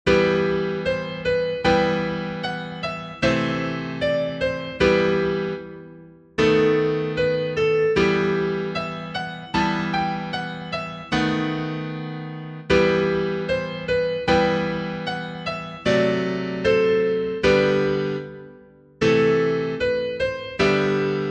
guitar; school adagio

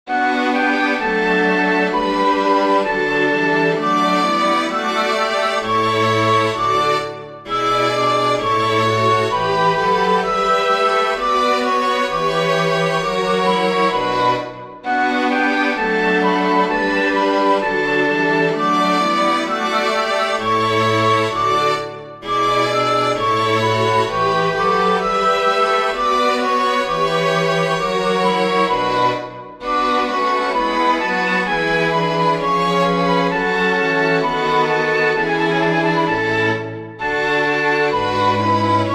chorale for flute strings; bach johann sebastian; was mein gott will das gscheh allzeit

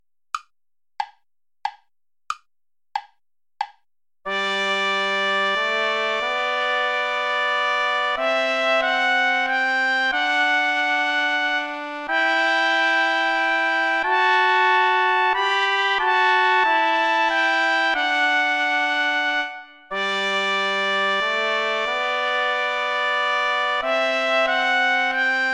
sarabande play along without trumpet

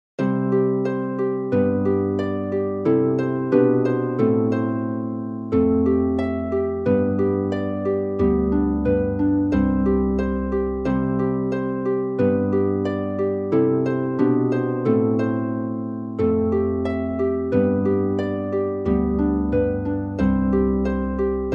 for flute harp; moore thomas; the minstrel boy

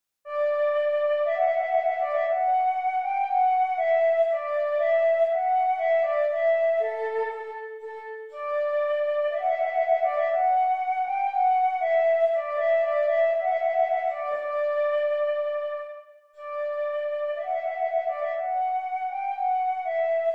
trumpet voluntary; clarke jeremiah

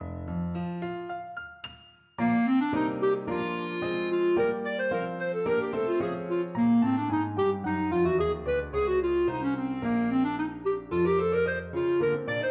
clarinet; dizzy clarinet

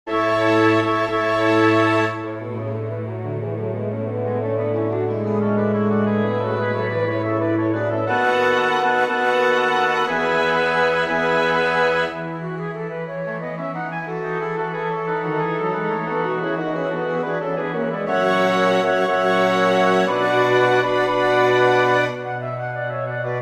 for small orchestra; bach johann sebastian; gloria patri from the magnificat major

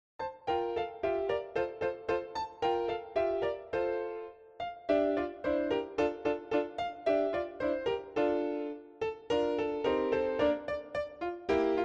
short pieces for piano; van der stoep dick

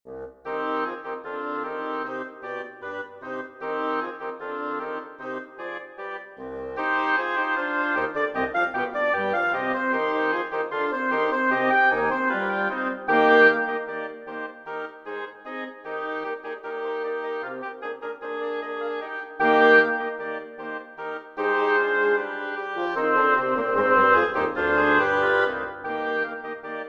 for double reed quartet; burgma ller johann friedrich franz; the gypsies

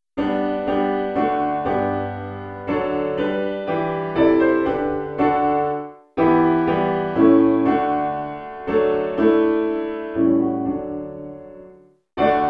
chorale; Chants joyeux

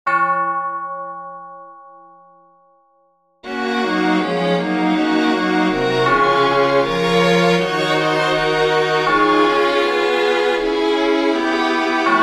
for oboe strings; saint saens camille; cloche the bell

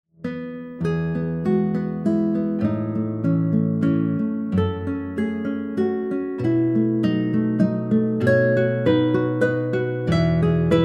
guitar solo; beethoven ludwig van; desir

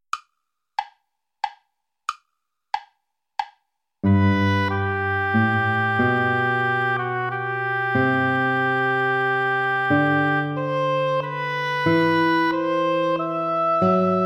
sarabande play along

